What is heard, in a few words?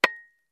pickaxe, miner; deathmatch; action employment; jobs